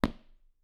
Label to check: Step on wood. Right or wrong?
Right